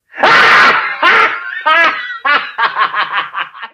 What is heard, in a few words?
crazy, halloween; Source Engine; tf